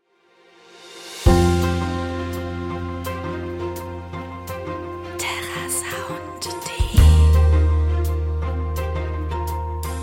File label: motivational business theme; terrasound